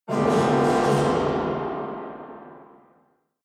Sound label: metal rumble